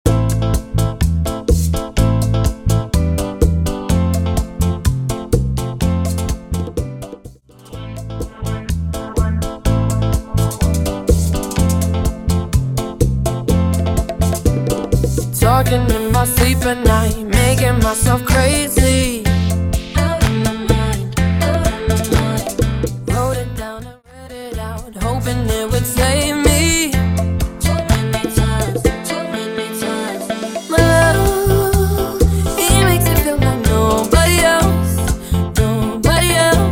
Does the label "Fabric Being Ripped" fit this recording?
No